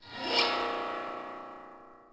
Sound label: Danger Red; Urban Chaos